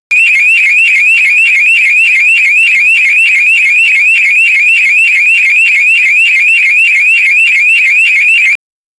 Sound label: alarm going with a; sound effect